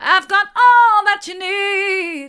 hard, vocal

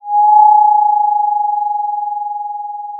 pop, Sound of a phone ringing

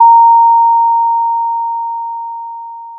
Sound of a school bell

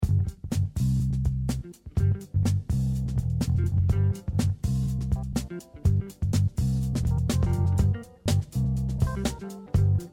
long loop

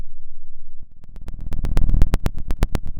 pop, Sound of a fire crackling

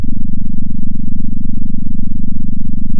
pop, sound of a small motorcycle

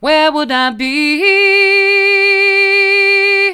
where would i be, vocal

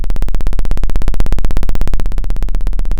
Sound of a plane taking off

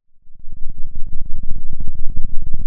Sound of a thunderstorm, center

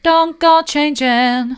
don't go changin soft, vocal

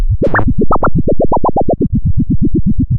pop, Sound of a duck

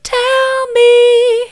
tell me soft, vocal

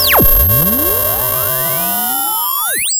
sound of a bike bell

